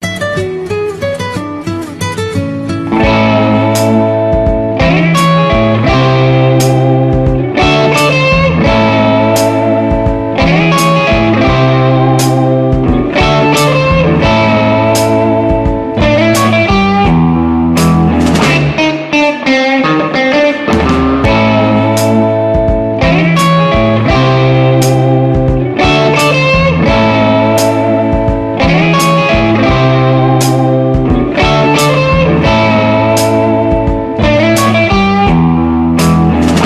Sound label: Nokia tune